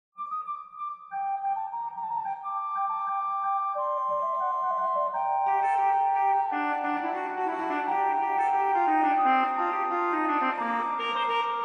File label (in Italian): Clarone bassi